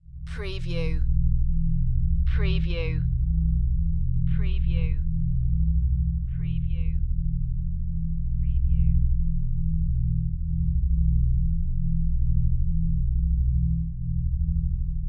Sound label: Low Buzz Flange